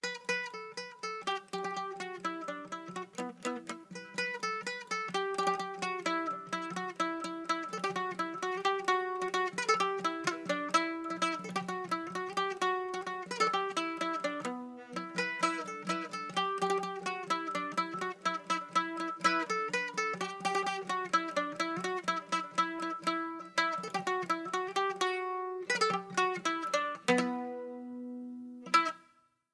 Tavern; Assassin's Creed Unity

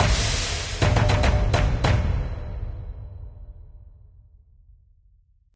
Victory Stinger; runic rampage

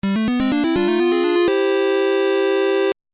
STAGE CLEAR, PC-9801; dragon egg

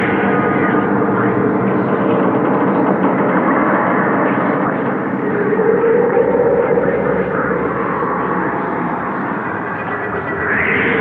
quarters horror

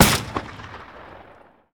Weapon Sniper Rifle-Fire

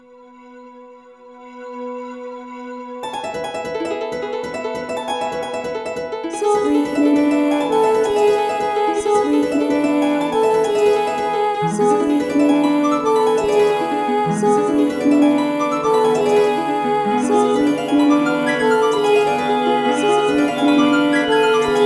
With Harp; the legend of zelda skyward sword wii gamerip 2011

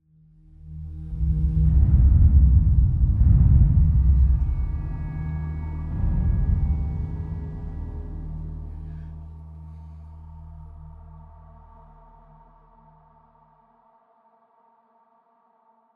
Dark Scary Explore Mood

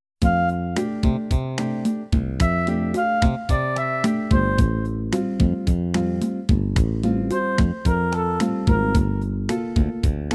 SYNTHE; kenshuui tend dokuta original soundtrack

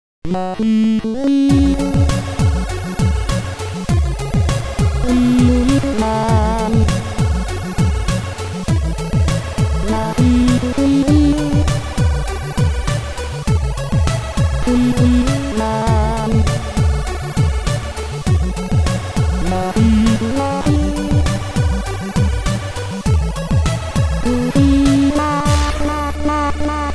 piano trance mix

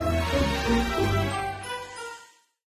Stinger Fail; Pet Rescue Saga; Android

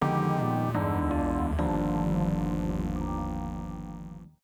Jingle, Game Over; Dirty Job

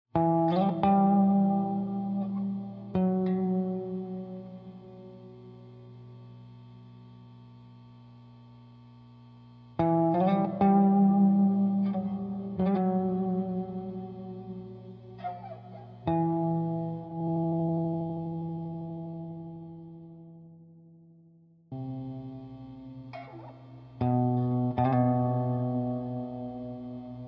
Dark Guitar Guitar Only

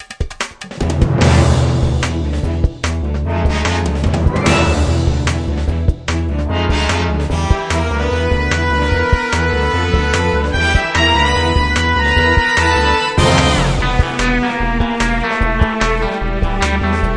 Music Minigame; cartoon network punch time explosion xl; Pkid

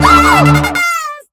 Car Horn; Hyperdrive Massacre; Ultracops Girls

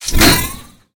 Pickups

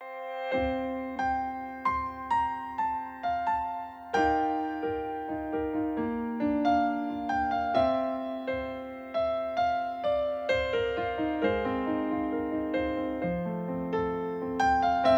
Super Animal Theme Piano; super animal royale

